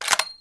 uzi mag out; firearms

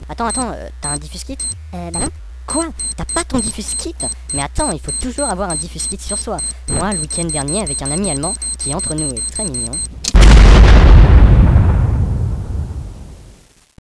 defuse kit

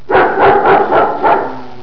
barking dog; svencoop

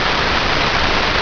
shower

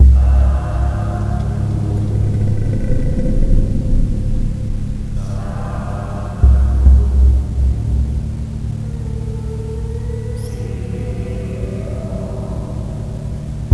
buzzer golosa; mopo3; cstrike

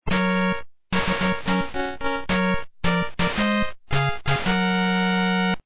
win; svencoop

